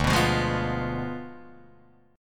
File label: D Diminished 7th D down Guitar Open D